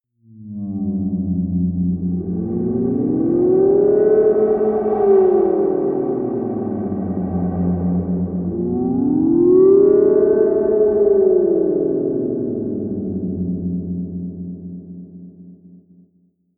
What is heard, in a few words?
swaying drone deep, drones; musikelemente